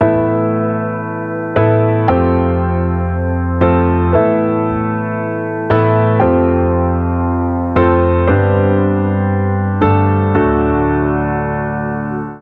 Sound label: Unique Chords AMaj(F#Min) 116 BPM; ultimate vibes midi pack 2